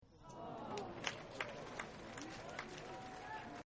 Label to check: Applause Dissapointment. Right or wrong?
Right